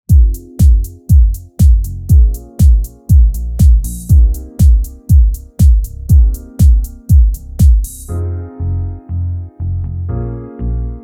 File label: Electro Smooth; DUB INTRO